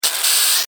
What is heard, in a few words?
Household Pesticide Can Spray; Yard Tools